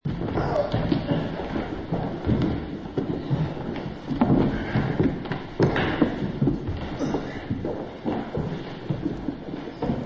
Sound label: Electronic